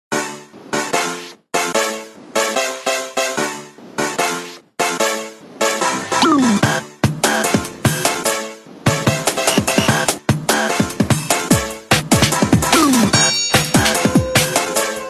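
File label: Nokia N80 Glume, ringtone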